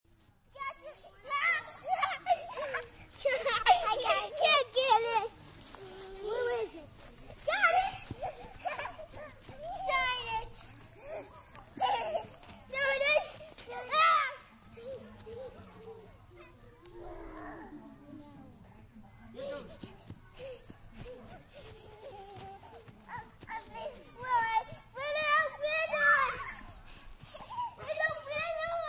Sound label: Ambience Kids n Park, Park, outdoor, 2 children playing and chasing each other; other children in distance, kids talking, laughing, giggling, yelling; VoiceBox